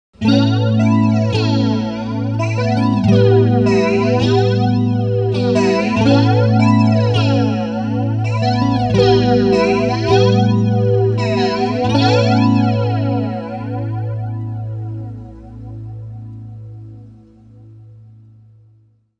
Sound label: Flanger